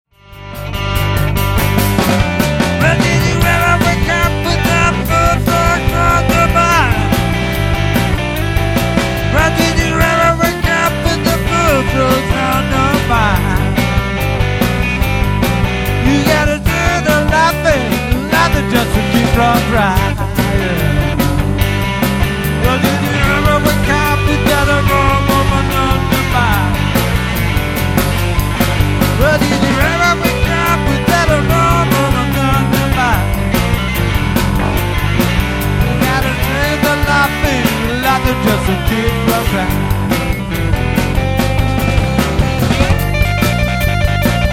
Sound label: blues